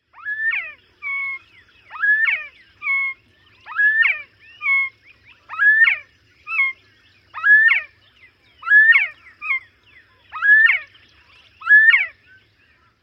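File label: Northern Bobwhite contact; bird language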